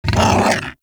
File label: Undazoa Vocal Death; Warframe; Hunting Critters; Characters